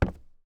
FOOTSTEP - Metal Board Walk Barefoot Male; Barefoot on Metal; Timothy McHugh; GDC Game Audio Bundle

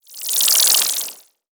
Animation Electronic

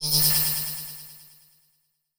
Title Transition Electrical Zap; Production Element Title Transition

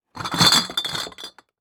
Box Put Down Empty Glass Bottles; Foley

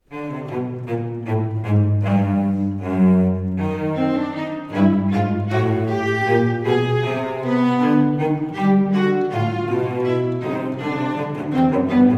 Canon a 2; Bach; Musical Offering, BWV 1079; Quaerendo invenietis

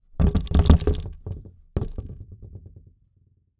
Foley Wood Rattle Short

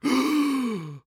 Human Male Gasp

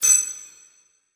Transition Bell Hit; Production Element Title Transition

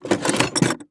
Industry Tool Box Remove Staple Gun

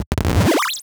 Multimedia Internet CD-Rom Flash Radio Element

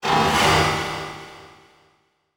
Transition Hit Impact Electrical; Adobe; Production Element

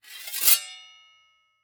Weapon Sword Pick Up From Ground; AdobeCC

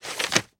Foley Paper Unroll Fast

